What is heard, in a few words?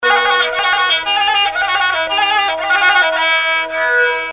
Chinese, worldpop